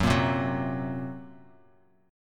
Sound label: Gb Major Flat 5th, down, Bass Standard; strummed; JGuitar